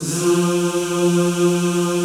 ZZZ F2, Classical Choir; Best Service; Extended Classical Choir

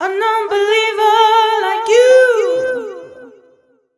f 132 mel, Vocals, fem

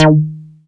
MINI Q PLK EB3, MINIMOOG; Big Fish Audio - First Call Vintage Keyboards

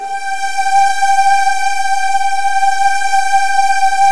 G3, Virtuoso Strings; ILIO; Kirk Hunter Virtuoso Strings VOL-1

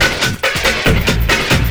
LOOP SD, Drum & Bass, 140 BPM

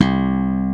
slap bass; E-MU Formula 4000 Series Vol. 3; Analog Odyssey; VK+ Instruments

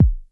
909 bass, Drums, Roland SH-09

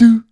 "doo"

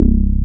BASS C1

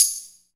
PER TA, Percussion, Tambourine

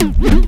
tribal up; PlatinumPhattCD1; E-MU Producer Series Vol. 8 – Platinum Phatt; Best of Phatt

